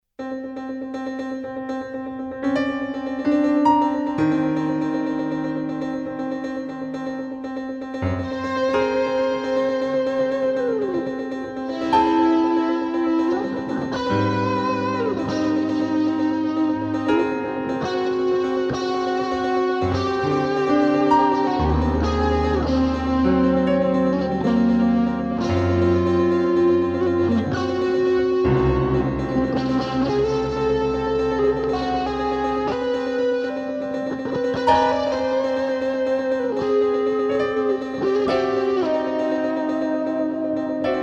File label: Duet excerpt; Duet Eight